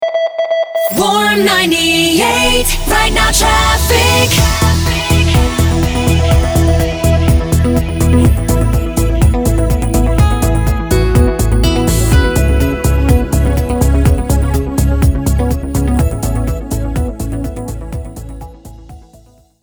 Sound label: Traffic 124bpm Main ID, jingle; Warm 98